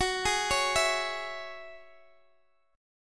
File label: F sharp 7th Suspended 2nd F sharp down Guitar Open D